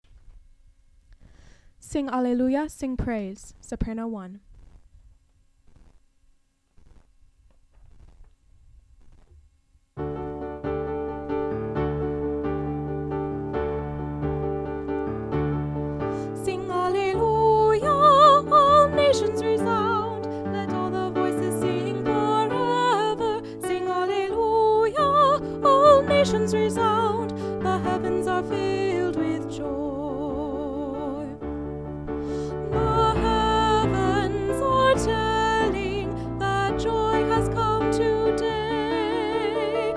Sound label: sing alleluia sing praise soprano